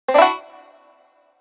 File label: Collect Mystery Honeycomb; Banjo-Tooie